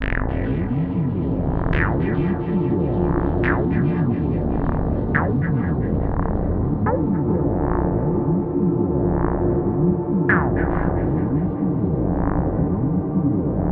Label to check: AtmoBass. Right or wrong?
Right